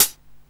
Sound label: Hat C, Alesis Hr16, Drums